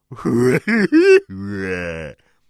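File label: sounds of voice of caveman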